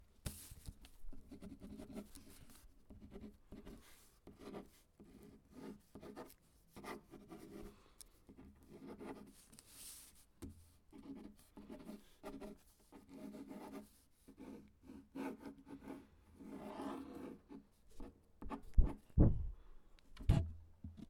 Paper Pen Writing

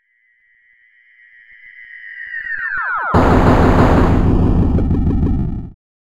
Meteor

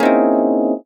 Discordant Chord

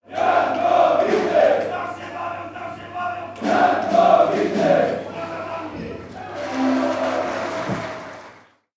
Massive Fan Doping

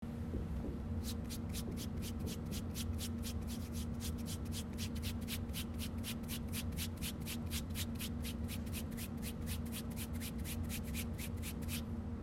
Makeup Brush

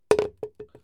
Vase A Rolling And Drops

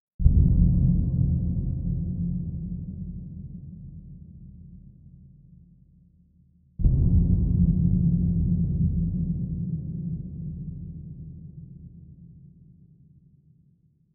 Boom

